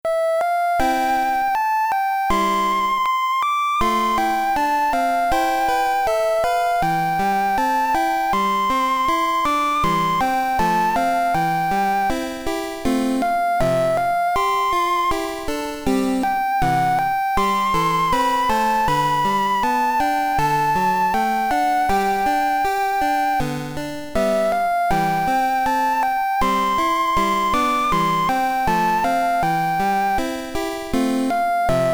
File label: Pokégear Radio - Poké Flute; GSC